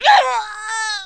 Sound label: death